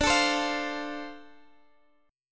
Boj Dm7

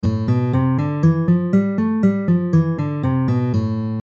Naturalnyj Lya minor

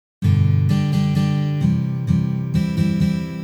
Boj Kantri na gitare

